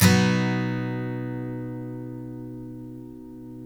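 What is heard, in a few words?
steel B major down, CHORDS STEEL, Guitars; Spectrasonics; Hans Zimmer Guitars VOL-1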